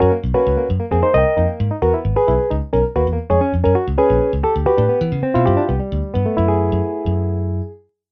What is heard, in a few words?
fanfare; Open source MIDI music by Fredrik Johansson